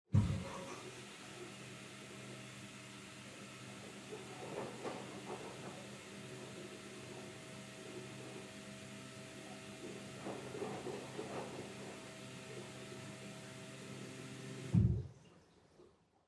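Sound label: Washer Front Load Operation Noise Far Pour Water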